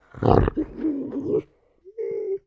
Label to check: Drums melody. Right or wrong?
Wrong